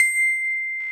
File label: FM Bells; 90s Sample CDs; Club-50 - Foundations Roland; FM Syns